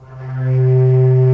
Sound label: BASS FLUTE C3, WOODWINDS; Greytsounds VOL-1